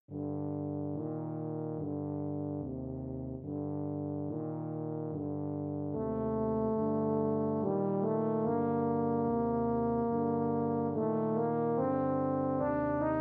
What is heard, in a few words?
Tuba Suite Dirge